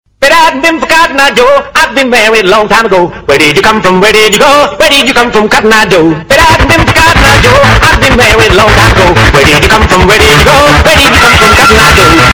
cotton eyed joe loud

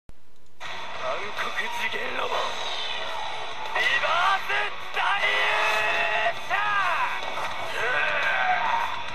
reverse

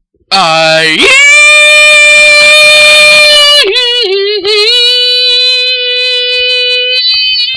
aiiiieeeee

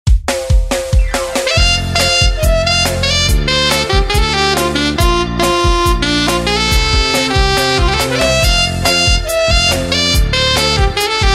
sax

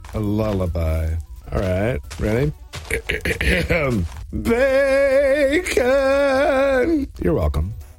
lullaby

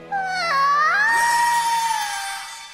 aaaaaaaaahhhhhhh

